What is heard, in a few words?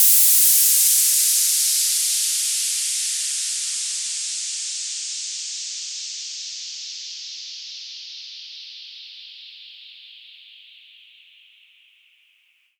downlifter